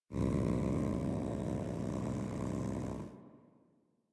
Feral Vocal Idle; Warframe; Catbrow

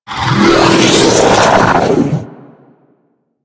Capital Ship Missile Whizzby; Warframe; Corpus

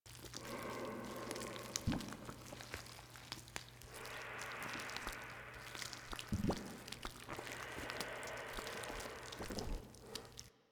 Fungus Loop; Warframe; Infested

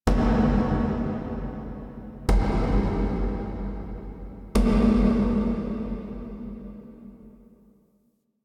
Misc Knocks Large; Warframe; Ambience; Entrati

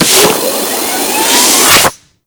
Bridge Floor Ramp Close; Warframe; Liset; Hub

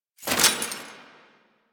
Mech Release, Rifle; Weapons; Warframe; Thanotech; Trumna Prime

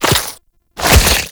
Proto Infested Mutalist Speaker Start Flesh; Warframe; Abilities

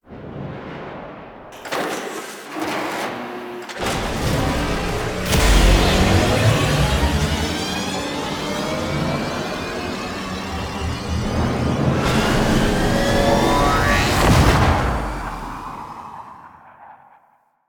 Exit Railjack Doors And Take Off; Warframe; Veilbreaker; Kahl

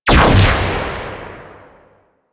Shotgun Shot; Crp Shotgun

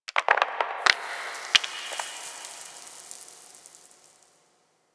Moon Dust Fall Small, Ambience; Warframe; Orokin

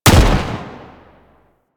Pistol Shot; Kohm

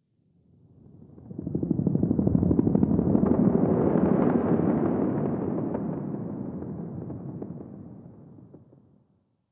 Distant Ambient Helicopter; Ambience; Warframe; Nineteen Ninety Nine

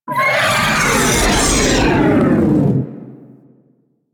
Vocal Stunned, Enemies; Warframe; Teralyst; Ropalolyst

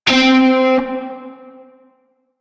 Shawzin Oct Three C; Music; Warframe; Narmer Shawzin

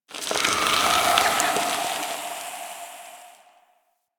Infested Pistol; Warframe

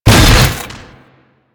Staff Impact A, weapons

